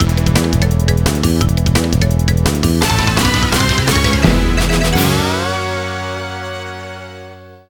Course Intro Fanfare; crash bandicoot series sound box

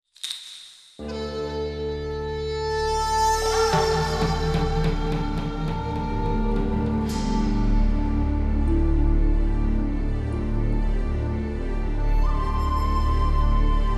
Background Music; Platoon

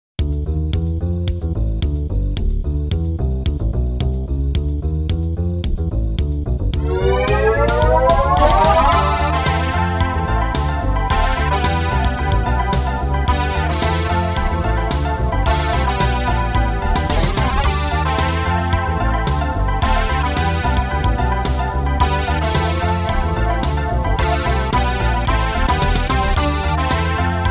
MIDI Instrument Version; power dolls 1 soundtrack resonance

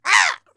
Bird Hit; Lucius III

